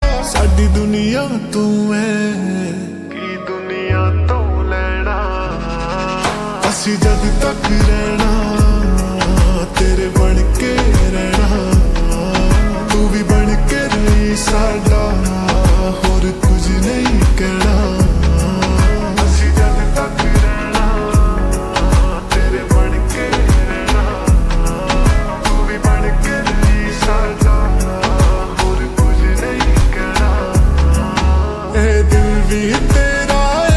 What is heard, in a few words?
Slow Reverb Version Ringtone